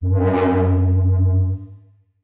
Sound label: Forbidden Worble F#1; radiosonics